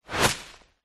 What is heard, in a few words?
foley tree leaves branch whoosh impact designed